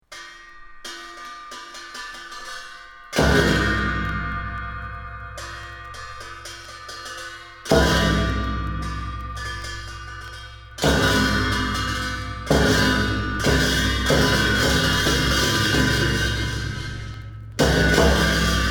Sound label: chime drig